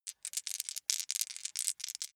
small three rattle in hand, wuerfel

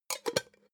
pot lid close; objekte; topf

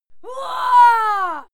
female fight scream

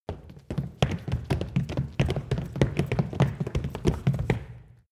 step group small run stop wood

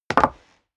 step leather shoes wood parquet jump; schritte

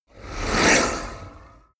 whoosh speaker harsh

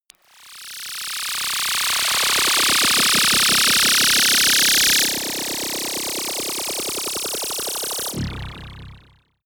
splutter noise long, sciene fiction

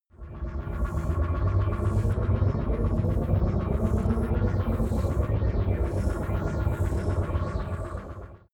generator smooth spin up; comedy-cartoon; elektronisch

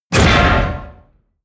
explosion metal impact; explosionen; comedy-cartoon; soundpool

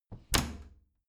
bathroom cabinet doors close heavy; objekte; tueren

